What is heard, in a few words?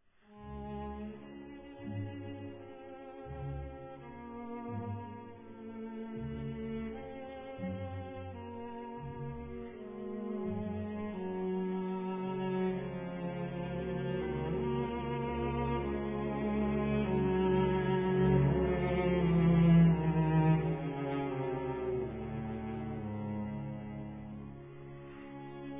Silent Woods for cello and orchestra; ANTONIN DVORAK